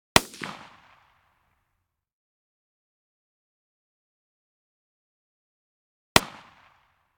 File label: .223 Rifle Blasts Long; Hollywood Edge; Heavily Armed